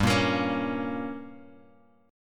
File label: G Diminished; Gdim Chord {3 1 X 3 2 X} strummed